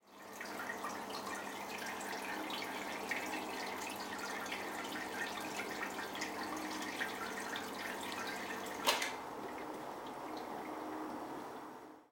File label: water